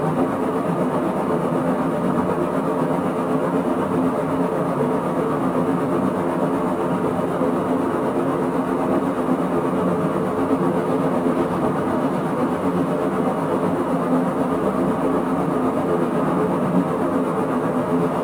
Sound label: Relay Amb; Warframe; Ambience; Hub; Tenno Junction Relay